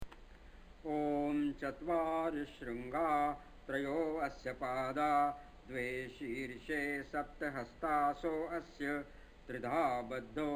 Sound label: om; chatwari shrunga; Mantras